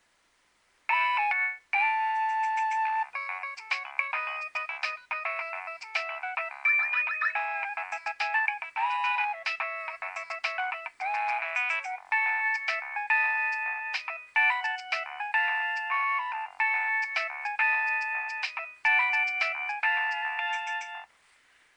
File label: nokia3100 ringtone